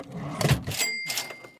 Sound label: cash register; BALLY; sfx; TWILIGHT ZONE; Pinsound; Twilight-Zone-Full-Reorchestration